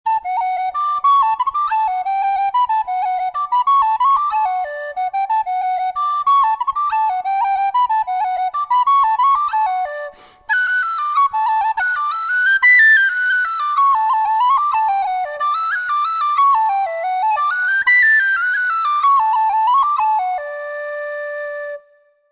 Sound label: Session in D